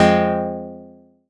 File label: stab zinc, stabs